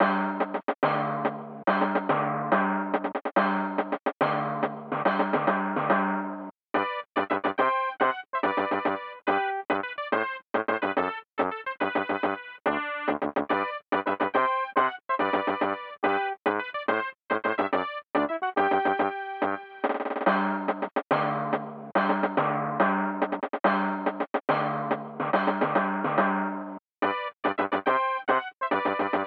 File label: March, Aircheck, Phono